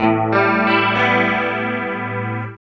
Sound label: GUIT; East West - Phat & Phunky